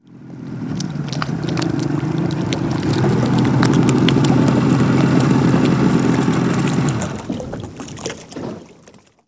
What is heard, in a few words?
back, boats; AKAI S-Series CD-ROM Sound Library VOL-6